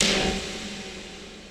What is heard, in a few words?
Snare